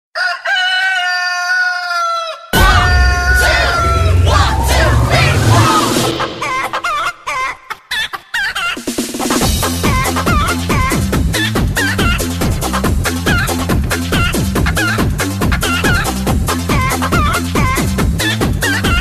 Chicken Sms Sound

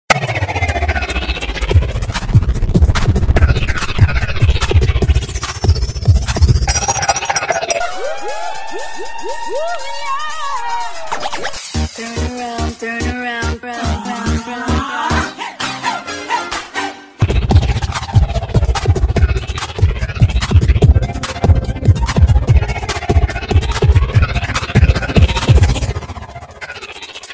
Cool Moto Mix

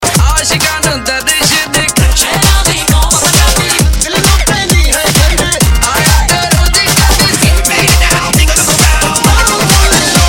Bhangra Dj

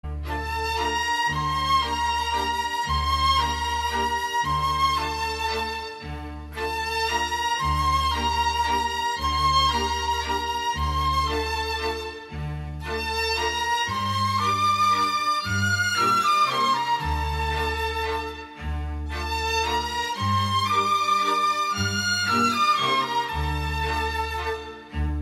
Iranian Melody

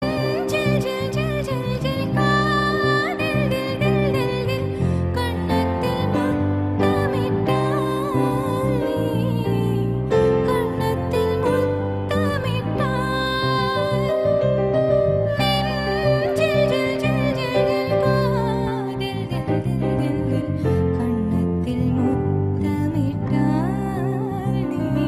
Unplugged

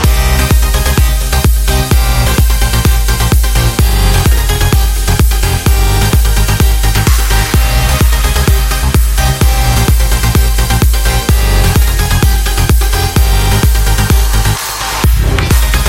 Electro

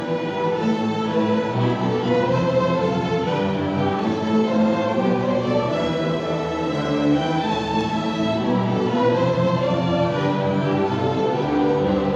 String concert

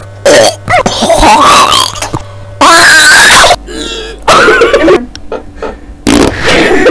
Burps; meloboom

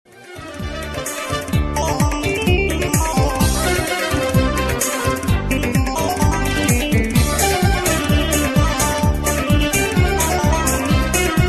Sorani Beat

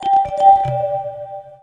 New Mail Sms

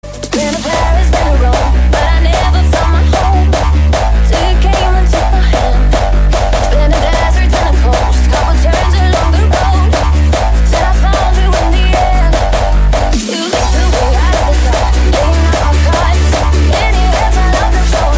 Fading Hardstyle

